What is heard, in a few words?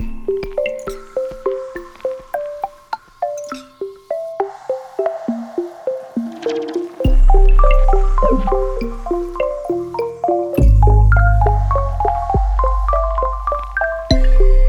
loops for cats, floor twirls